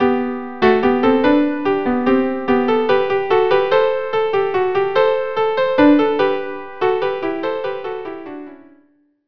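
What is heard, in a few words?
violin viola duo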